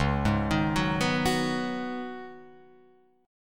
C sharp 7th Suspended 2nd Suspended 4th, C sharp, down, Guitar, Open F; arpeggiated; chord